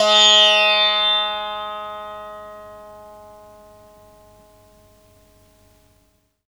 Indian Percussion Tabla; Mango Loops

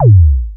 bdrum long, DRUMKIT, Percussion; Giga Samples Collection; SYSTEM100

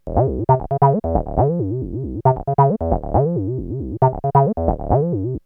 303 Loops; X-Static Goldmine

